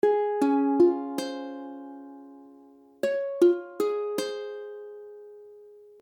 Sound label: Cismaj7, ukulele; ukulele akordy; C#maj7